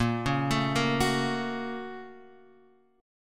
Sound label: A sharp Minor Major 7th Sharp 5th, down, Guitar, Half Step Down